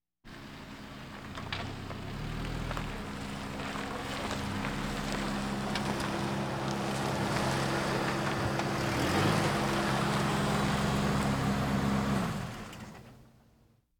Automobiles various

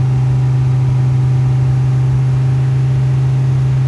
Viper GTS Loop, Automobiles

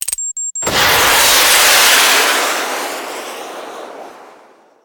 Missile Launcher, Timer And Release; gun and weapon sounds; captain audio; fully loaded

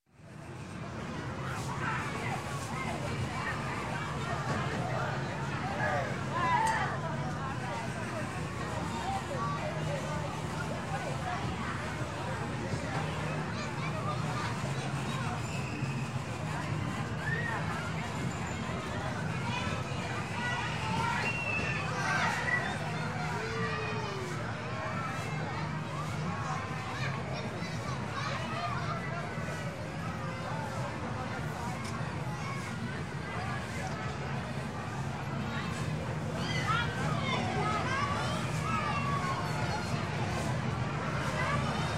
Fair, Amusement Park Midway Ambience Crowd; Games, Rides; Sound Ideas 2000 Series

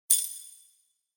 Carabiner

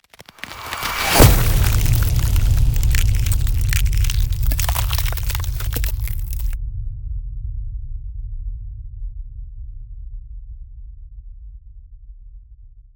Ice Spell Damage AoE Icy Meteor; Arctic Aura

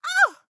town human road hit; Blind Drive

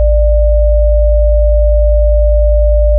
tone; firefight

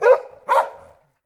dog distant; DeadFright

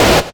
Static; Blastwave

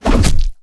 Knife, Throw Knife Into Flesh; gun and weapon sounds; captain audio; fully loaded

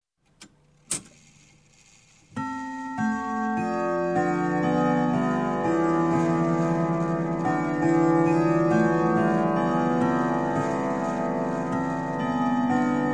Clock, Grandfather Whittington Chime - Strike S; Strike Six O'clock; Sound Ideas 2000 Series

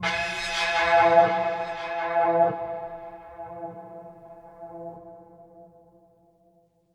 String Mystery; Inquisitor 3

